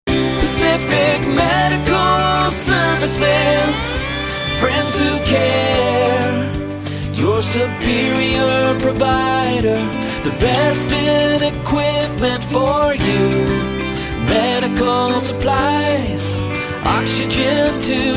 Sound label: Full Sing; Pacific Medical